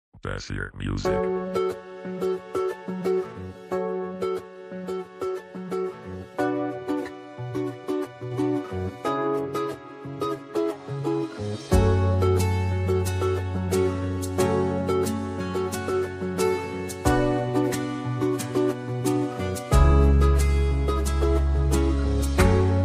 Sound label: musical background love ringtone